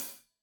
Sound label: Hat Loose, Hi-Hat, Cymbals Stereo